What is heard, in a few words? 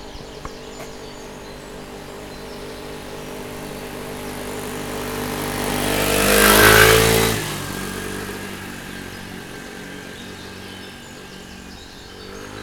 motor scooter pass by; A motor scooter passing by on a village road at 5am on midsummer's eve.; near cobs